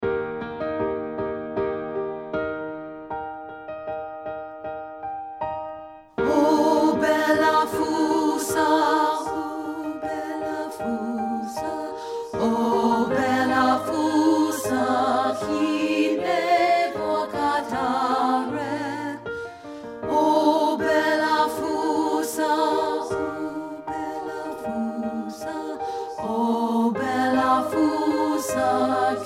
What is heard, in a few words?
ALTO